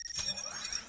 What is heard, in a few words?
Beep (Open New Window); Warzone